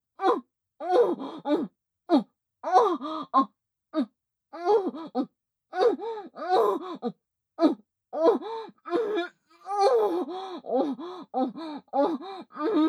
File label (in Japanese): バック・喘ぎ・激しめ, ASMR; loop音声